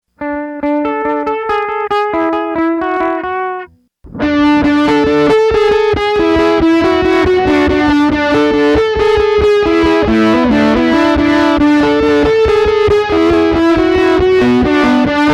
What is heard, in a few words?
B Muff Memory Man; K Korade